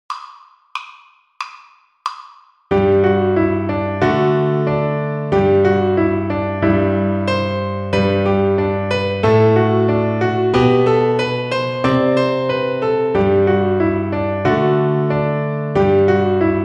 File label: String Gym, Piano Accompaniments; Etude 21 - Tuning our A, B & C Pals